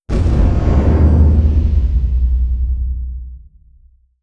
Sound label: distance neutron hit layer; apocalypse